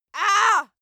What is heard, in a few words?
female attack; schreie; menschen